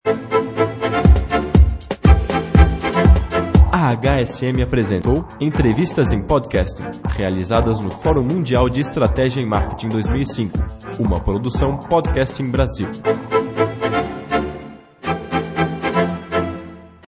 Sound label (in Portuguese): vinheta encerra